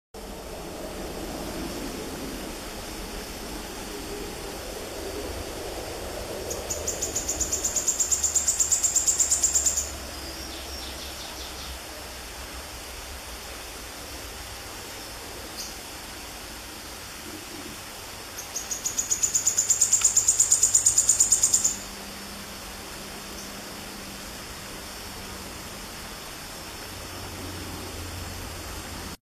Canto do joao porco

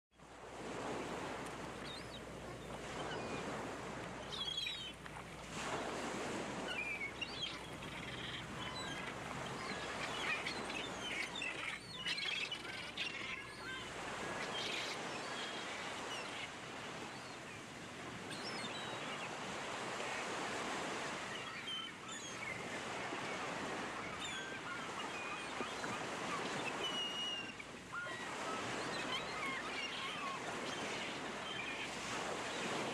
Gaivota Sons

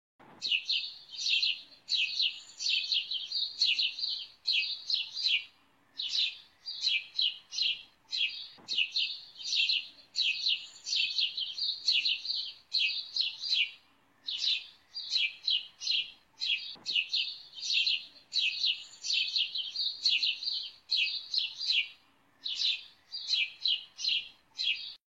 canto do pardal miller

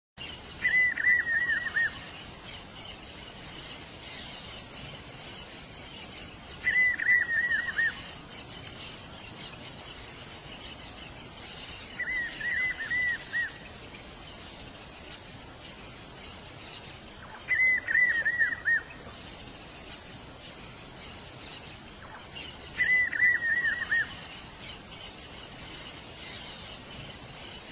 Canto do rapazinho do chaco

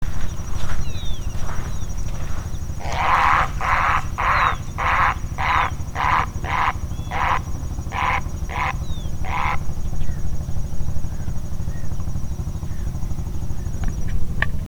canto da garca moura

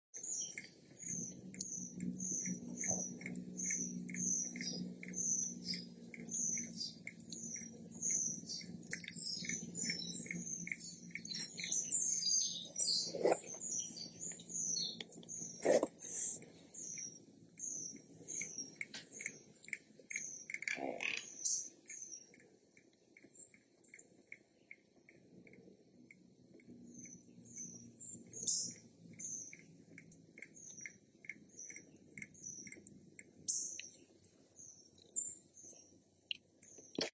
Canto do mutum cornudo

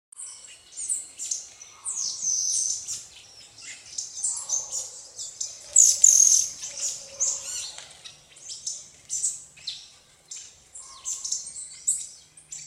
canto da saíra militar

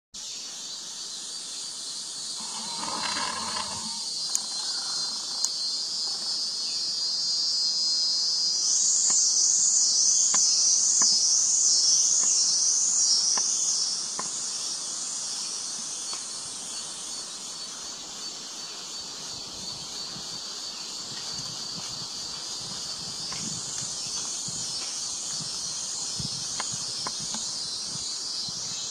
canto do surucua de murici

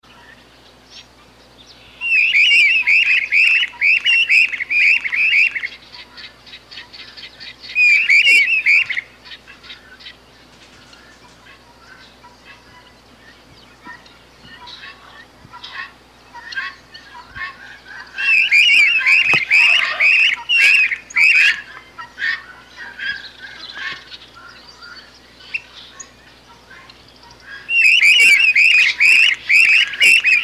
canto da tesoura do brejo

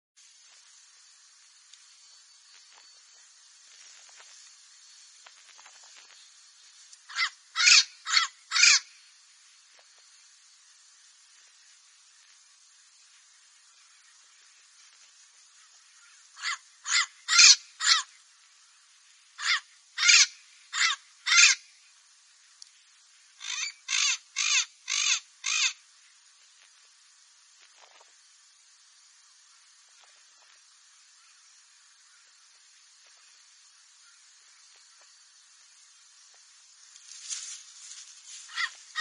canto do papagaio verdadeiro

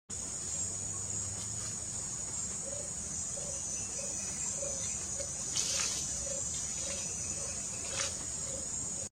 Canto do capitao de coroa

